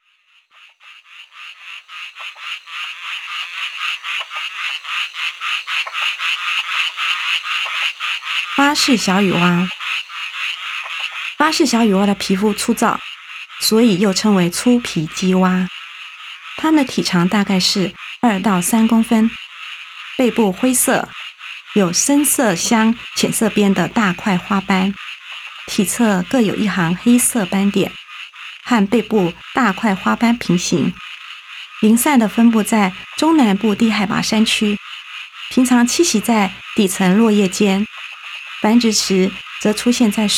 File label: Microhyla butleri